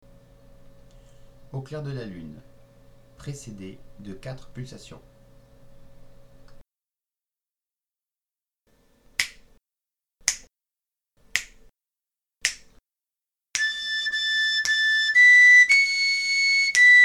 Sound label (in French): Au Clair de la Lune, galoubet; 440